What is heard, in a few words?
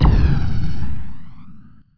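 plasma rifle; PC Gamer IT CD 41; machines demo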